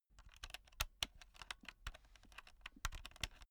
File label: insert usb laptop wrong long; objekte